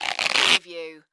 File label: Latex Glove Stretch; Sound Effects Bible